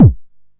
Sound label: Bass Drum, Jomox Xbase-09; Drums